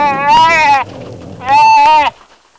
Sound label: cattle